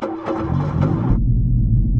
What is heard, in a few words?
tank start int; Original TK Sounds